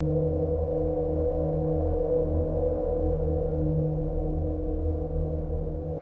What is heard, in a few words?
thin synthesized ambient pad swell; designed